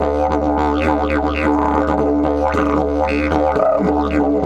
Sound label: 108, Didgeridoo